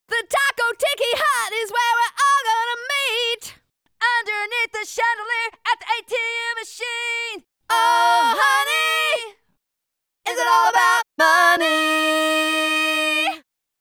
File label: Vocals